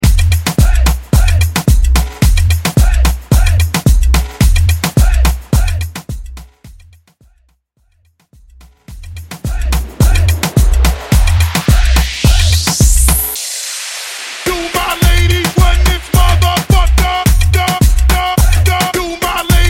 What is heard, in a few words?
Mainstream Re-Drum Hype